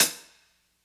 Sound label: drumbreak hihat dry